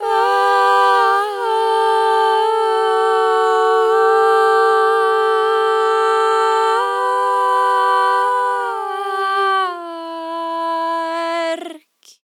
vocals dry; airspace hybrid reverb and delay; modeaudio

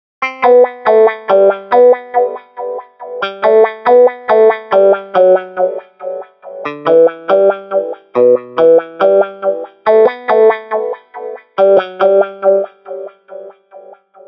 wacka wacka 500 hz 2700 hz, wah; e wah wahfx300

